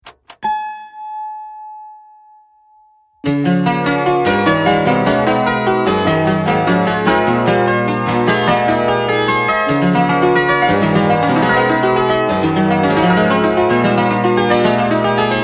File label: Love for Chord